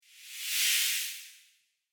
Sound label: Sand Whoosh Faint